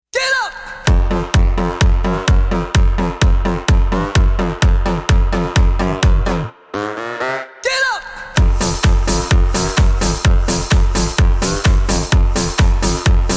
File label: No Rap